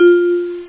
glockenklang

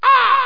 geier; blackshoot

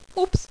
aehemm

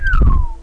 feuer

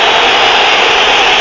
Pfeifkonzert; Amiga-CD 1997 #7-8

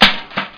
becher

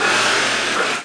Schlitz; Break

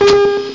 diamand gesammelt; MegaBoulderDas; boulderdash

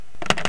KLICK

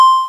Stech-Sound; ZeitErfassung-Server (Demo)

